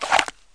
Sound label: holster, weapons pistol; KINGPIN